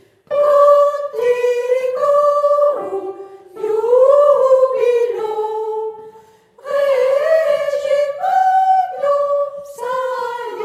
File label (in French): sopranes